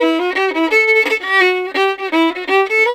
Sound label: VIOLIN, Celtic; USB Soundscan vol.42; Celtic Flavours; AKAI